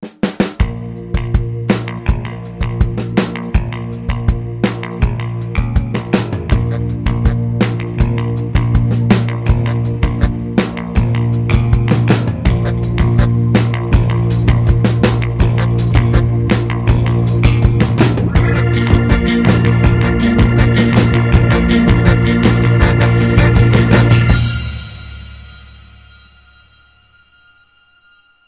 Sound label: Energy Dance